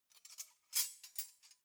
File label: cutlery knives handle